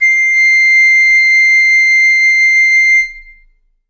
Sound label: flute acoustic